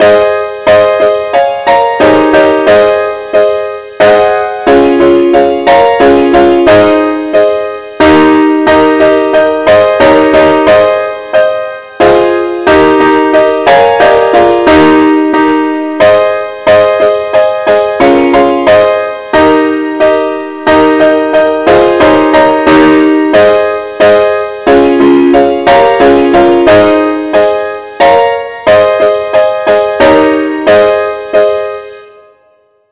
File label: compare chords; i need thee